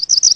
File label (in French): sifflement